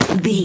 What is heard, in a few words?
foley object grab pickup rough